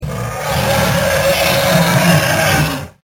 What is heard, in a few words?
lion scream